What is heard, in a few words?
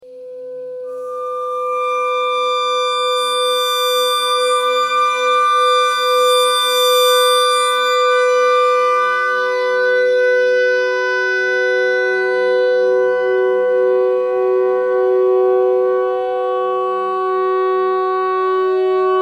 clarinet notes e clarinet notes e thread noise